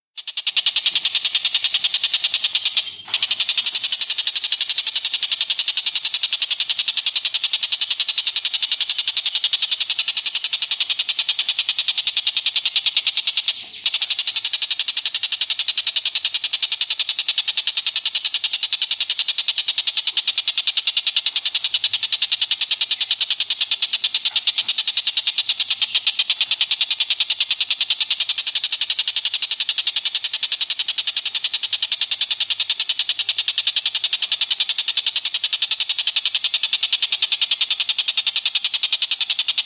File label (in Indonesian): Suara Burung Tengkek Buto